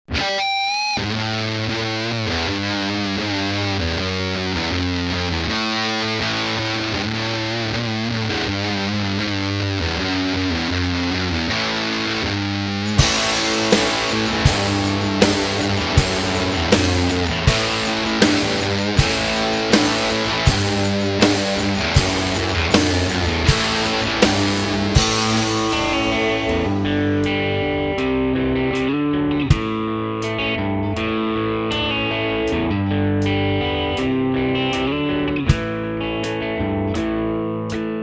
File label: Karaoke